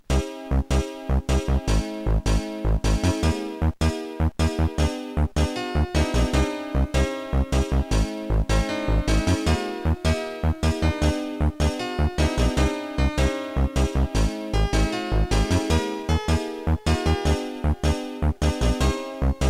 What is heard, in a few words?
dance time; Symphonie Pro